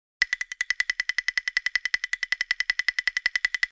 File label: bangu, china instrumente